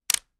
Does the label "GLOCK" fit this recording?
No